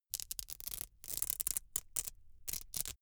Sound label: velcro open cap really slow